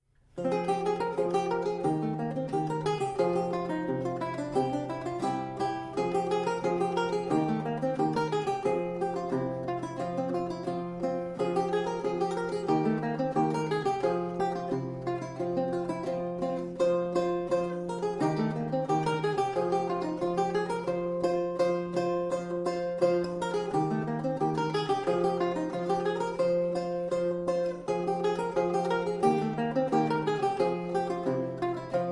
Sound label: music for the lute; washa mesa der hupff auff gassenhauer